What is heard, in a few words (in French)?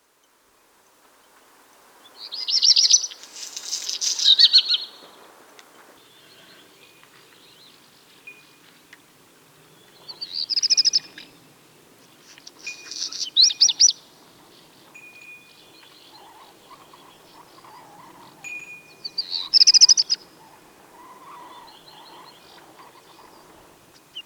rougequeue noir